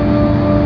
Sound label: humming